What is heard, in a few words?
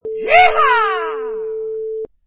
Rebel Yell